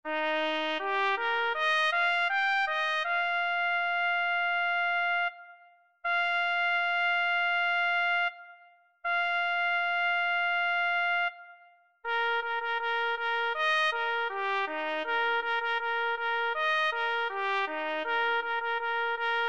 clarim

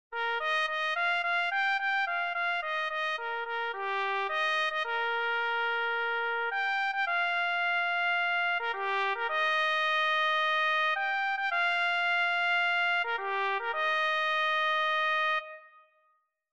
CMT REG CAV clarim

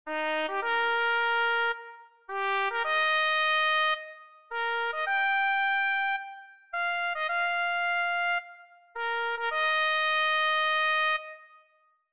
bandeira clarim

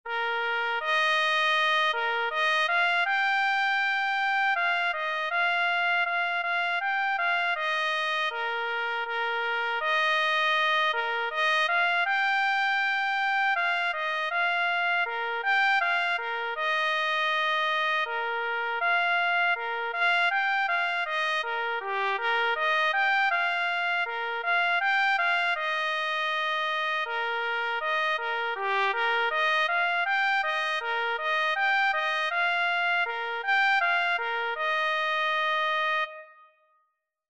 ALVORADA clarim

